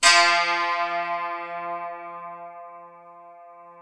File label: F3, DULCIMER; SoundBytes; Early Patches